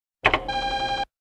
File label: Button Push and Door Lock Beep; BSG